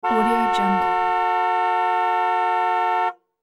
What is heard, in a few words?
buick lesabre long horn blast